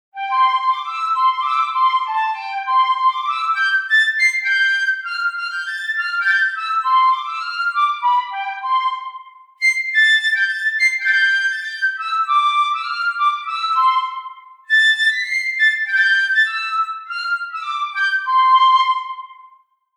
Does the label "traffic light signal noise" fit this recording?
No